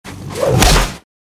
Tiger

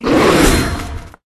Rat dodge

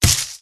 flower block

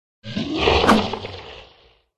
dead pirate boss attack voice bottom

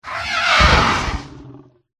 sea monster death

